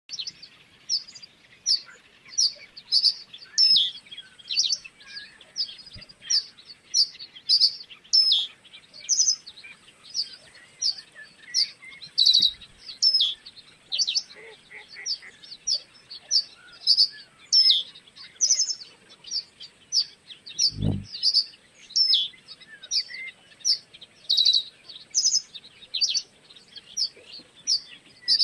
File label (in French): chant bruant des roseaux